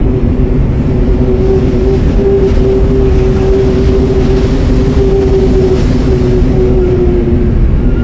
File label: wind howling